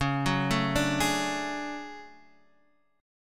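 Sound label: D Major Sharp 11th D flat, chord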